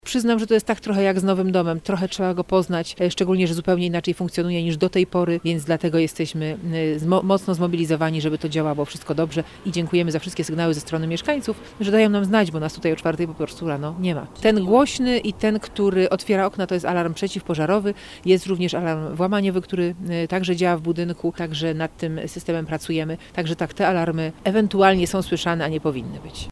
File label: alarm; palac